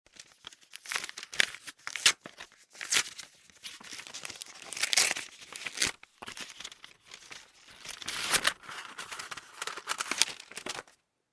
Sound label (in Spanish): abrir carta sobre